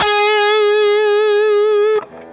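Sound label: fuzz guitar vibrato; FUZZGTR1 VIB; Sonic Reality; Vintage Timetraveler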